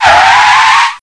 cars stop

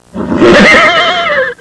horse neighing, farm animals; Sound Effects for Multimedia - Volume 1

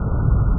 hum; Bonzala; Revista CD Expert 50

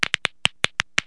KNUCKLES, CARTOON HUMAN

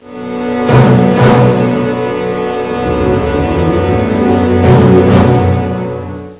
dramatic; szenarion

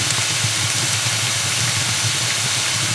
fire hiss; DAoC

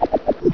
Collapse; Galactic Frontiers™ 1.0.2

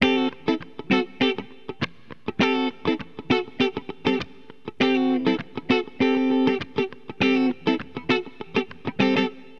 FUNK GT, Guitar; LiveAct Pool 1; AcidJazz Funk

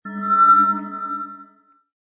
snd Invisible; smashing

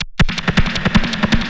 drum loop D+B 160; eJay

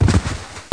bdy bmp; Total Chaos Nukem 3d Pack; duke

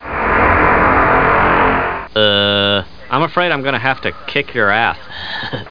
damage; butthead; items

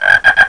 GOOD FROG; PUZMANIA

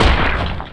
troll punch

